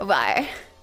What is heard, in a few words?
rawr